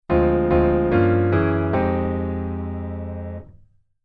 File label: Jazz Waltz Chord Intro